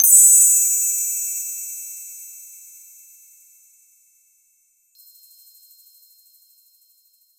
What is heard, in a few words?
satisfying game, activation